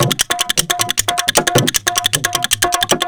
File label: DHOLAK, Indian Traditions; USB Soundscan vol.45; AKAI